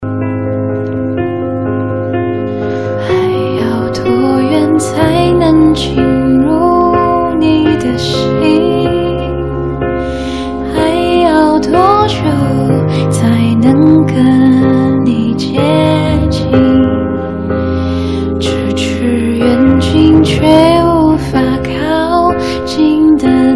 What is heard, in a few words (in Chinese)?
翻唱